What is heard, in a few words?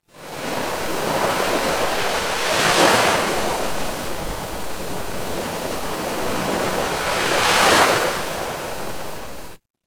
ambience ocean